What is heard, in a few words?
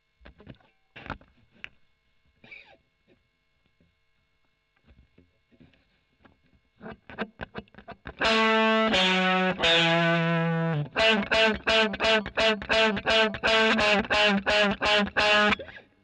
E.GTR OD; Gefell